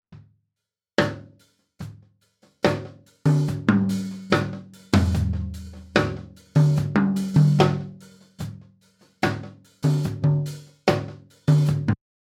Toms; mit MH CS

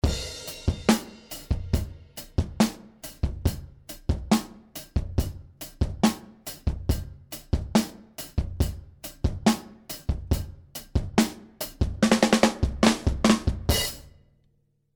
Karacter Drums Mid Side Mode; Elysia

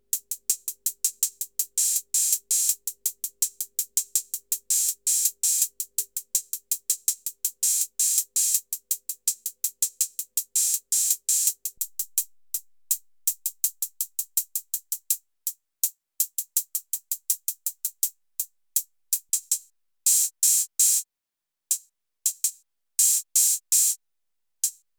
HH OH; Yocto

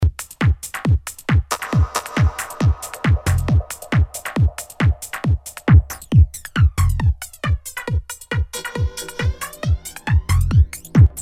Jet

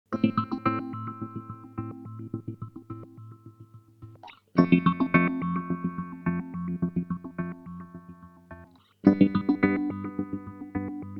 Boss SL-2 Auto Routing

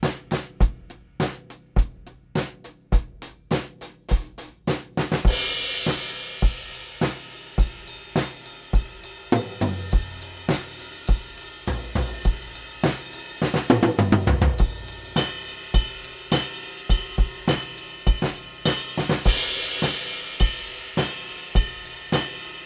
Drums Front of Kit Small Room; Melodium 42b Musique

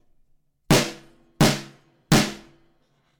Snare

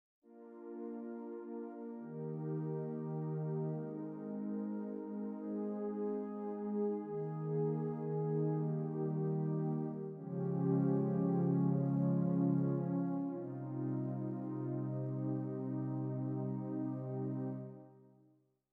Roland RD 800 Pad

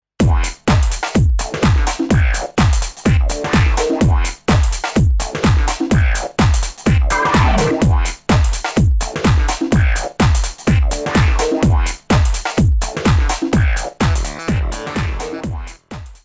Electribe SX Garage

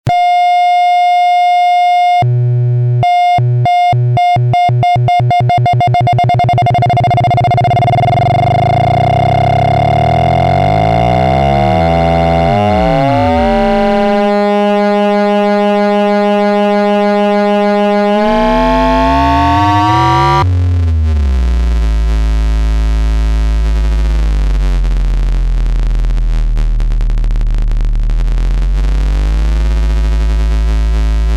LFO Full Range

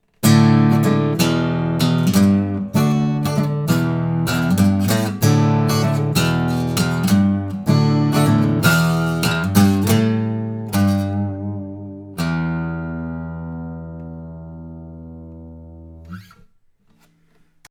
C414 Git

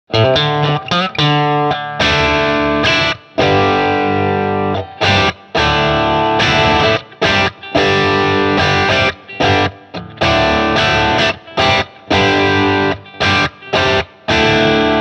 Boss IR-200 British Stack Gain 10 Celestion

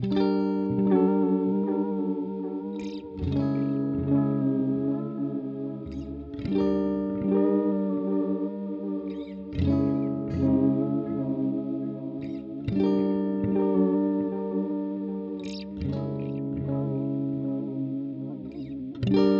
Chase Bliss Audio Thermae Modulation

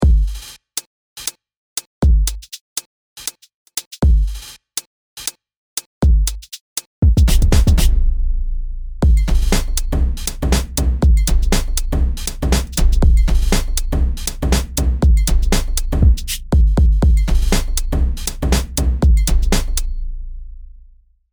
Remix Beat

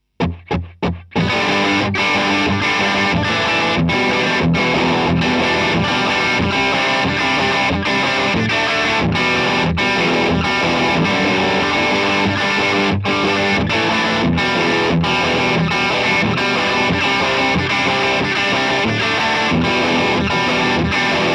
Low Gain Riffs

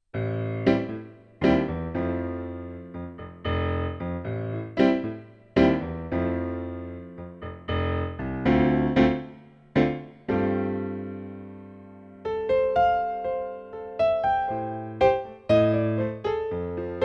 Piano

